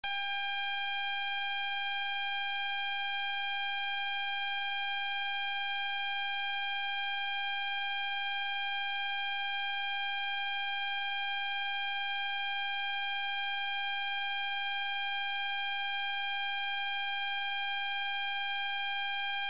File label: 800hz